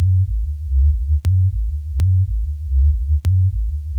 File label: groove wobble bass